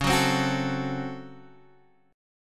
C sharp Major 7th Suspended 4th Sharp 5th C sharp Guitar Drop D; 11,9,10,11,10,x